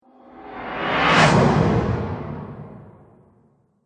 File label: Whoosh Transition Explosion